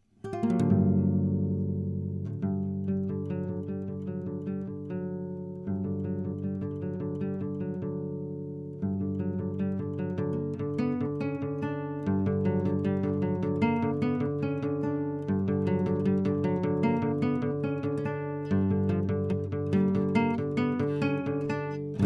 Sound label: Dissonant